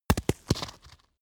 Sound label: stone drop roll